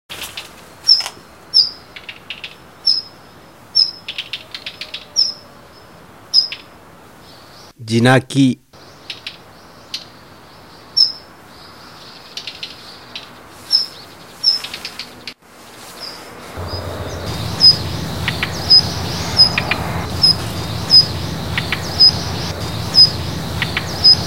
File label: joubitaki, bird; azamidani